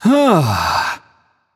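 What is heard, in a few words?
nonverbal sigh; voicepack; smite; merlin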